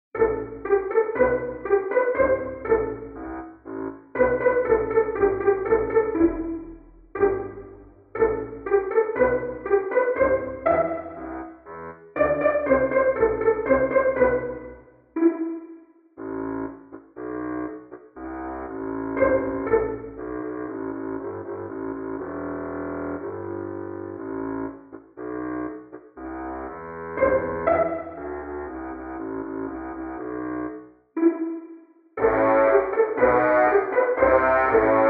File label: ringtone; DanisRace